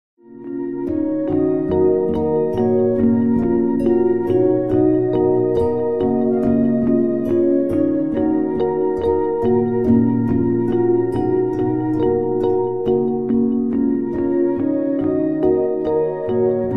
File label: Balladss